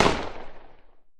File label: explosion smoke far